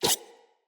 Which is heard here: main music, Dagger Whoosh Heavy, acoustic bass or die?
Dagger Whoosh Heavy